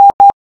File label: Morse I